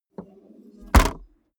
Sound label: car bmw e46 exterior trunk close